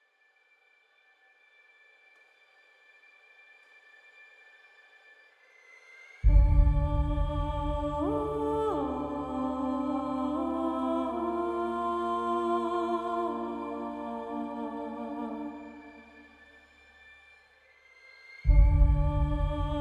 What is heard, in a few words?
amb; lethians crossing; Tyranny Gold Edition